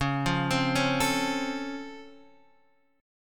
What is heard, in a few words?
Db Augmented Major 7th, Guitar, Standard; arpeggiated